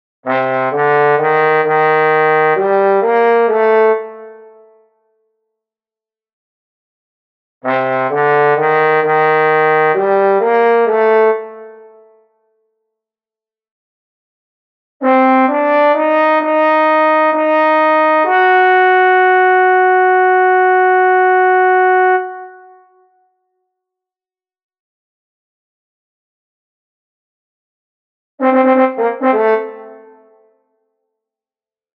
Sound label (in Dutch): Trombone